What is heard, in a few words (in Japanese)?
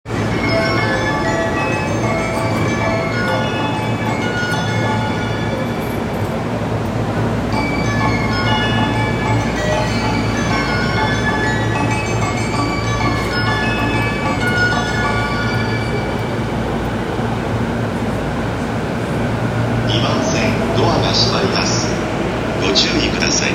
横浜線 橋本 南, train sounds